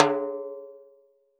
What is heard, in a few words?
Timbale Low; Roland BK-5; Percussion; Timbales